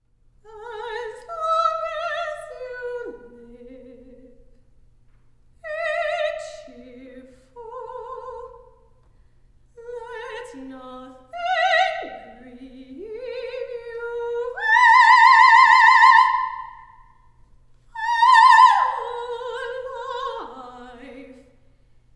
three greek songs for soprano alone, epitaph of seikilos